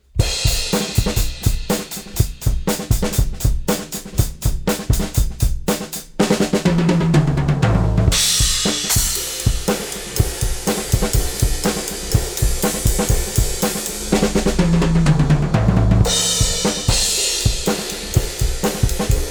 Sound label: Drum Bus; SSL X-Limit